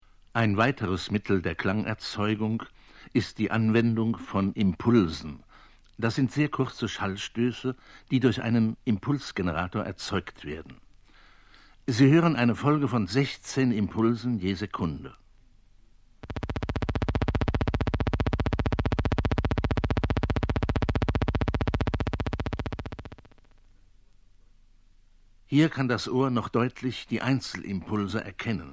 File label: impulse; eimert